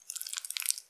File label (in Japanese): ゆっくり 1 ストローク, ピストン運動; １ストローク単発